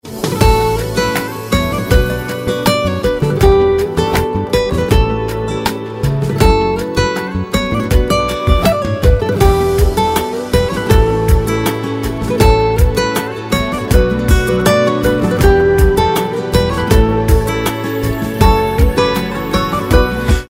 Honeymoon Ringtone

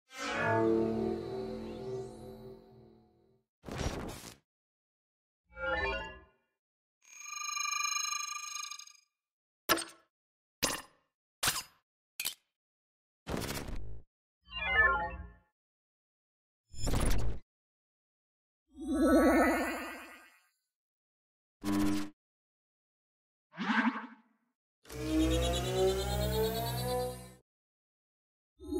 Science fiction notification; نوتیفیکیشن علمی تخیلی

